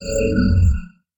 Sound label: teleport, portal gun